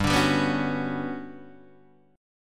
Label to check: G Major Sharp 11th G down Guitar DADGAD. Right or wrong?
Right